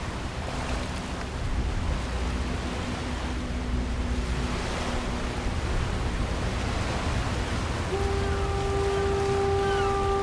soothing gentle sound of waves with a distant cry of gulls and rare whistles of